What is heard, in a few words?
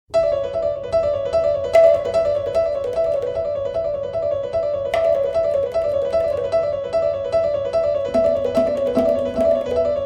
Improvisation for Piano